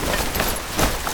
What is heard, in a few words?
big jump arms; Call of Duty Modern Warfare